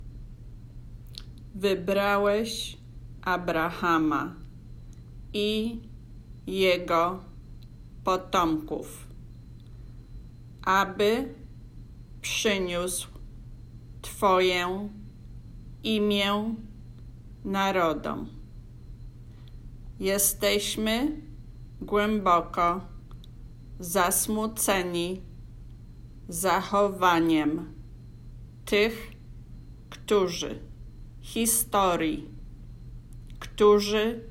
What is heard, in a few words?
Polish Lyrics Soprano Slower; Fox Oratorio